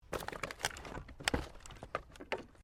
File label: Wood Movement Minor